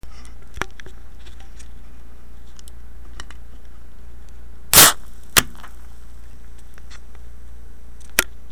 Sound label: beer